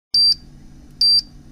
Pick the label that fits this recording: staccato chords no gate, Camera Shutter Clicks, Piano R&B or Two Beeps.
Two Beeps